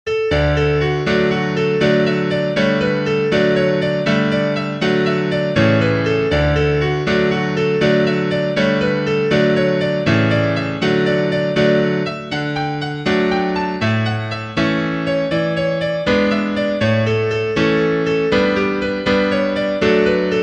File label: piano, sheet music; Ballymoney